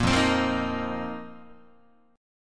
F 7th Suspended 4th F down Guitar; strummed; JGuitar

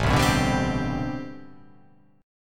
A Minor 11th A, Chord

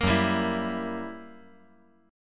Gb 7th Flat 9th Gb

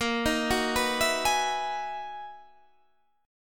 B Major 13th A sharp, guitar

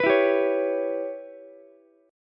C sharp Minor 7th Flat 5th, Ukulele; strummed; 16,16,15,16; JGuitar

